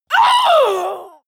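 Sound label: female combat hit hard, screams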